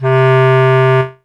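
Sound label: E-Mu Proteus FX Bass Clarinet C3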